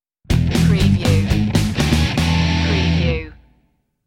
rock sting

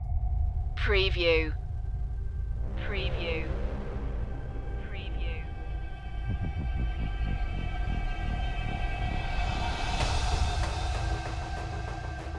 no guitars

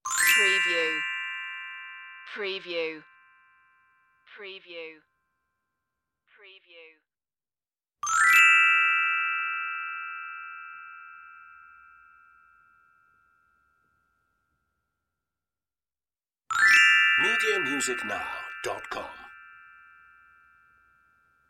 glockenspiel surprise slides

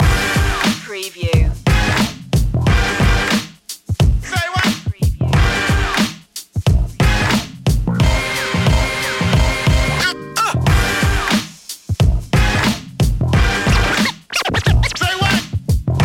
boom bap loop

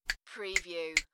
Finger click click click; beanstalk audio

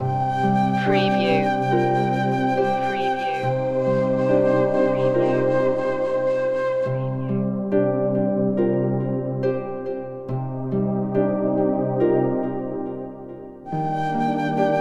harps and bamboo loop

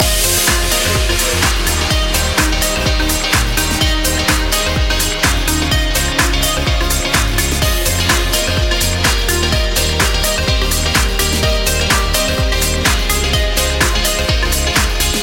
drop drop drop loop

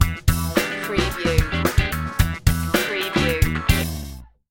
cadillac stinger

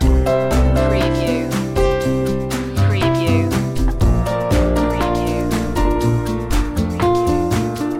country road loop

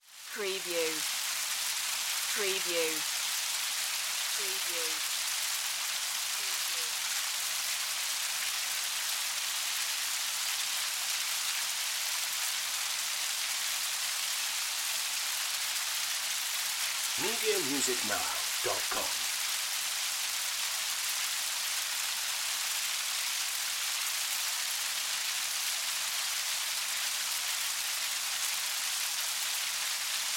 Nature Weather Rain

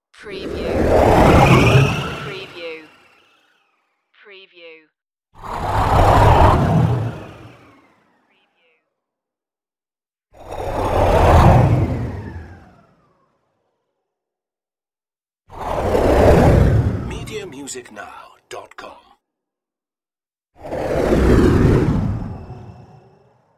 future vehicle passing

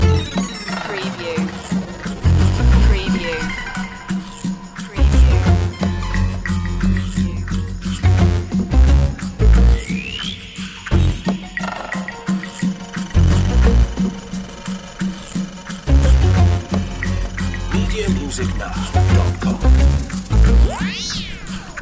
retro video game kazoo world loop

